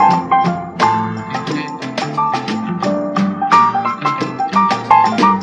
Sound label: piano; Phonky